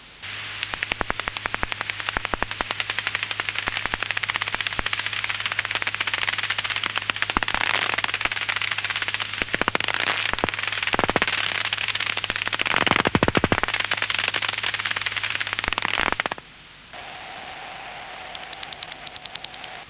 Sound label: myotis nattereri; chauve souris; sonotheque